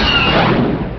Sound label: laser